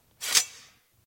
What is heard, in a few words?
hidden blade sheath